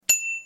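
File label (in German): Fahrradklingel Marienkäfer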